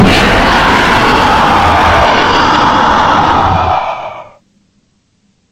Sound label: jumpscare sound; five night at animal 2